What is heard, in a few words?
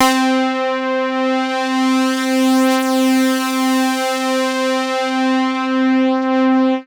MOOG BASS C4; Best Service Dream Experience